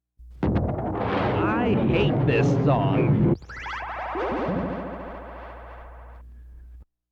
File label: thundershot